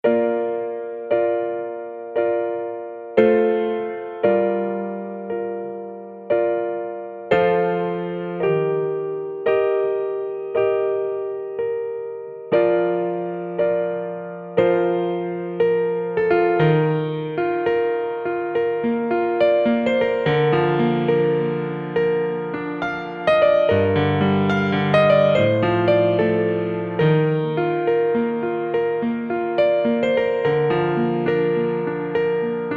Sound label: Piano